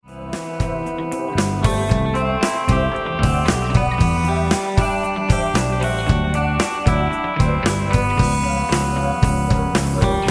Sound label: Music Only